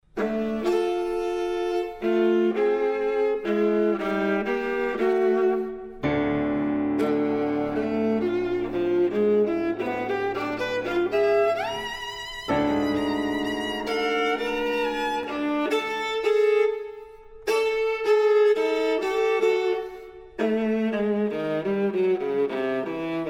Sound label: Prayer for viola and piano